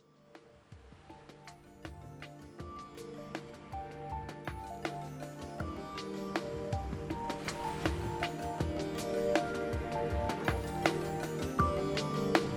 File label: Water Ocean; Solaris